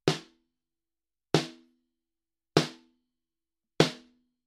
Portico 5024 e606 snr silk

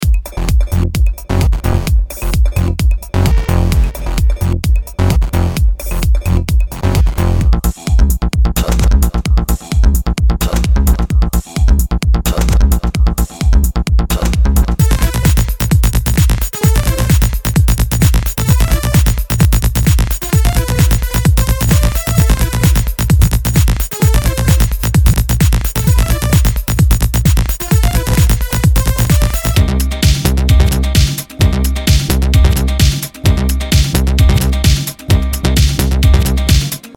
Combi Loops

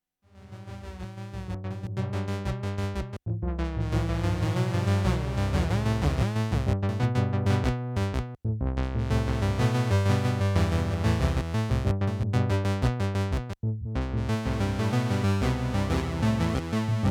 Wampler Terraform Malekko Manther Flanger

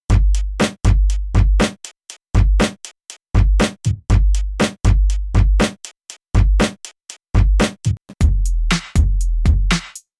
Drums; Sampletank

